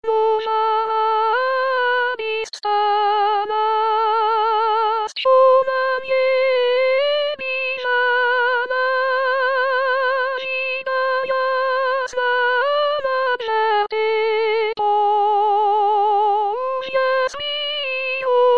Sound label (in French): noel ukrainien soprane